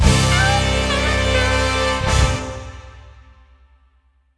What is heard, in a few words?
bigWinEnd; jackhammer 2